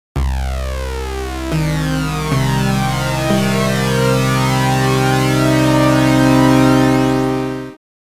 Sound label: Comb Resonator